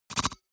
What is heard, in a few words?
noise metal scratch splutter; maschinen; signale; soundpool